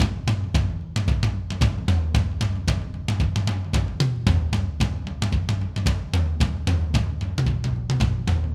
Acoustic Live Drum; Platinum Audiolab

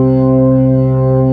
B3 C 2; Best Service; Advanced Media Trax Modern Composer; B3 SMALL2

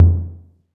perc classic tom low

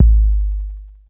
bass drum deep, techno trance drums

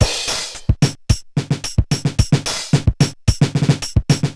Korg MR-16 (110BPM), beats

